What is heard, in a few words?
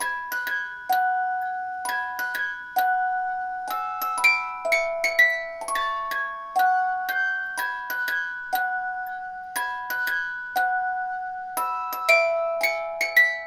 Music Box Loop